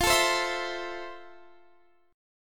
F Major 7th F, Guitar, Drop D

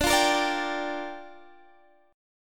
G Minor 7th, D

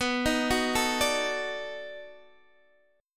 B 7th Sharp 9th, down, Guitar, DADGAD

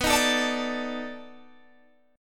B 7th Sharp 9th, down, Guitar, Open G

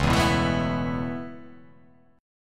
C sharp Minor 7th Sharp 5th C sharp

